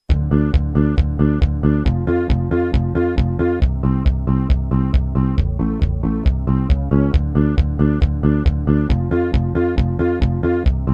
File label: disco; shin megami tensei ii; atlus; 1994